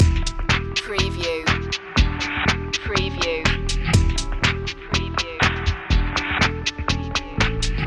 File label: disco loop